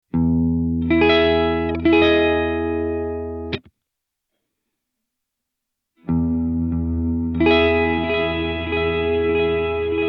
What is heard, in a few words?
Delay Filtered Feedback Super Long Decay